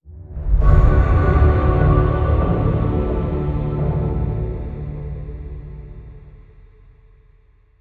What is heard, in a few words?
Final Fall Stinger; Never Alone; Chap 06